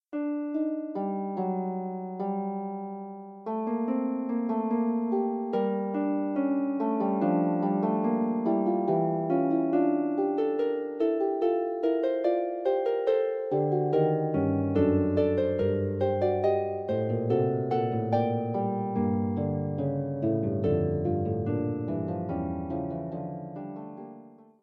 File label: Fugue, Harp